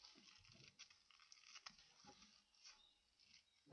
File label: flash